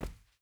footstep barefoot cloth jump land; Rust

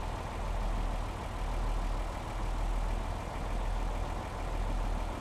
valentine engine rpm; cannons; War Thunder

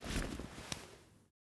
bone knife deploy; Rust

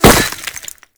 impact masonry; Modern Warfare

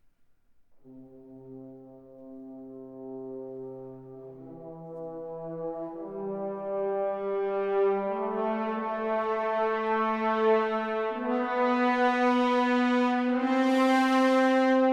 Symphonic Synth; Civ5; War

